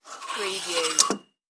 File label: cloth hanger slide